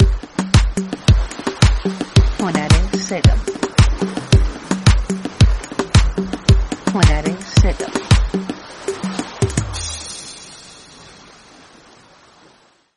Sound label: persian groove